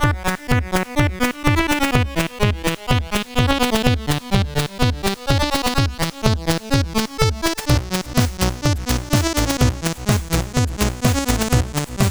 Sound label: sid CSG8580R5; Aerodynamic